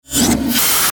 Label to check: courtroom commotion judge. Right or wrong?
Wrong